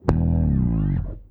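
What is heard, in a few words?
SHORT FALL E1, BASS, EFX FALLS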